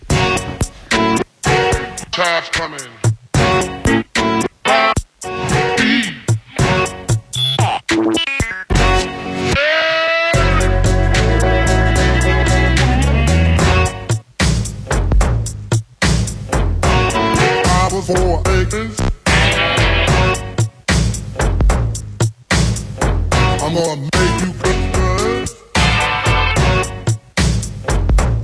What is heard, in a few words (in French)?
Musique Blues